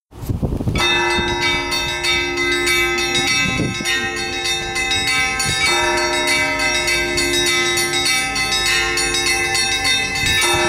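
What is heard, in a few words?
Zvon, bell ringing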